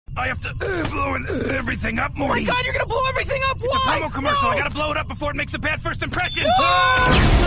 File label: blow up; taunt packs; rickmorty